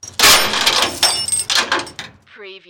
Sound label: Chain Metal Clanging